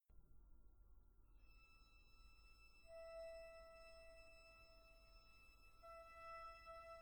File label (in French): Note tenue; Cours education musicale; Voyage en Russie